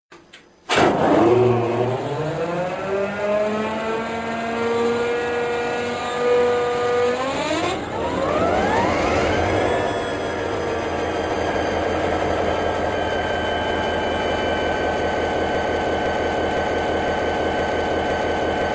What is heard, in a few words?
EMD 645 Start